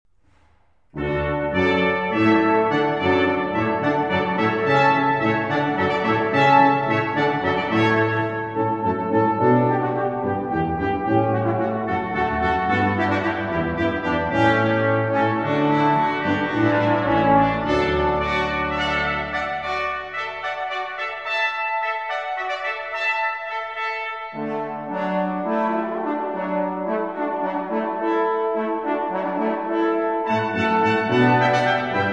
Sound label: Quintet; Allegro Maestoso From Watermusic